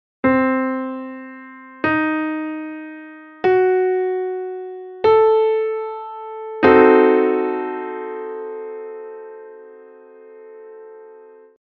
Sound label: cdim7, sax